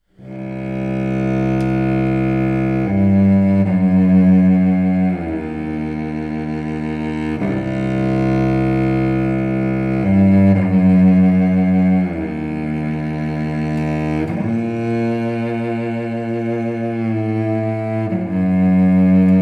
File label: Jewish Cello Suite